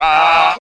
"rah", Voice

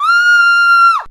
scream

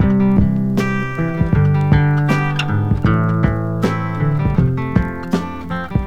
guitar, Phonky loops

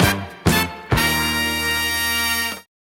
horns, phonky loops

